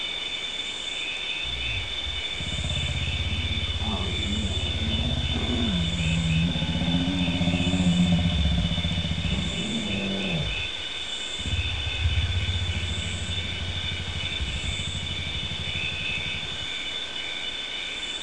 ambien

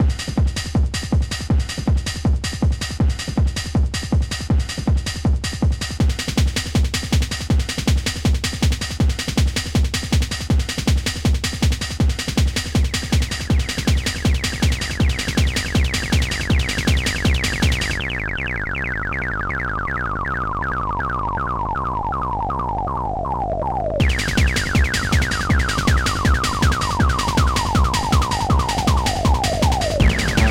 rave train, techno; Octamed 6

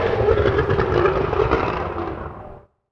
external backfire, car; F1 2002

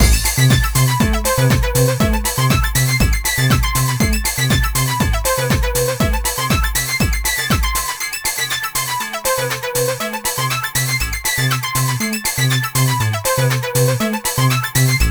Bubbles; balloon rain; Music

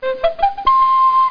FANFARE

